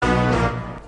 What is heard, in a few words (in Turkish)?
iflas sesi